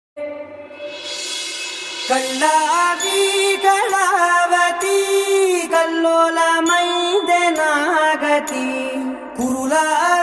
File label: song ringtone, bgm ringtone; sarkaru vaari paata